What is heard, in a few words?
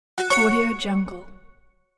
glitch